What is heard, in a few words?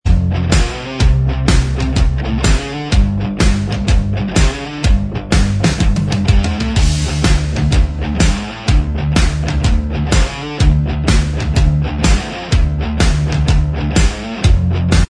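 instrumental track, backing tracks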